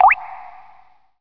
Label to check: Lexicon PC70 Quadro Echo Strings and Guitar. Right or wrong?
Wrong